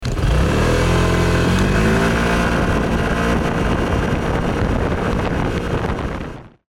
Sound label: normal acceleration; jf81; pcx